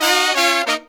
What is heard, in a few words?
Horns 132BPM; Zero-G; Phantom Horns